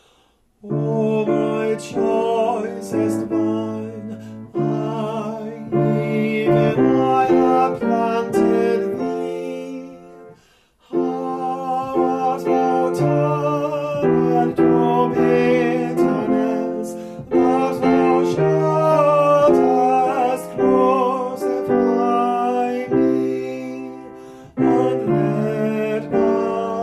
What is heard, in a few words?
tenor